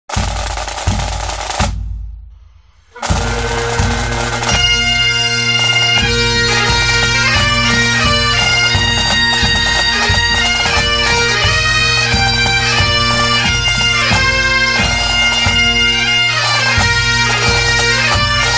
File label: Kilted Music; HIGHLANDER